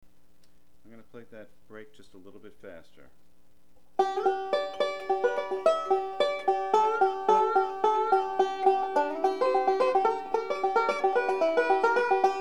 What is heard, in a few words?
lonesome road blues fast